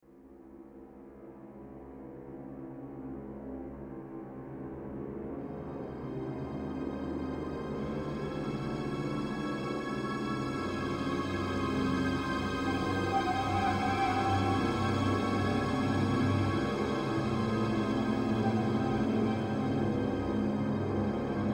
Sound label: mistery dull atmosphere